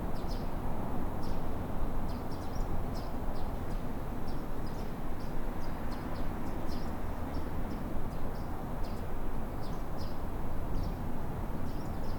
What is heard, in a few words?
indoor janitor room morning birds; LOOP; anemoi